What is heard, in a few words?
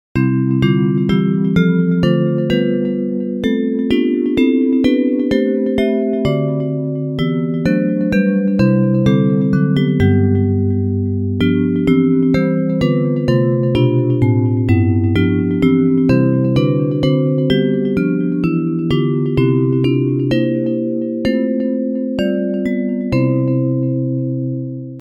Bells; When Morning Gilds the Skies; Hymns